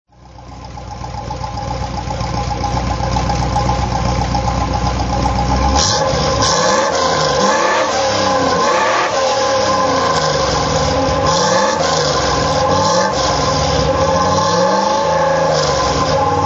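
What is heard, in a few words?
MGB GT V8 throttle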